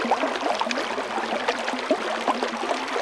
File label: subterranean water exit